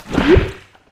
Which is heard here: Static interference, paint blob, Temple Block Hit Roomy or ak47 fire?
paint blob